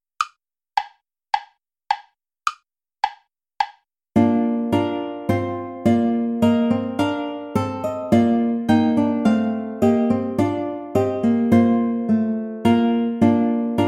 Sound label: play along without guitar; bach johann sebastian; what god ordains good indeed